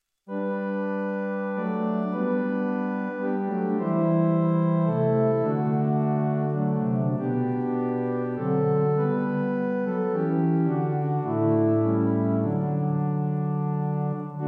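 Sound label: scaled principals large and small diapasons; Westminster Abbey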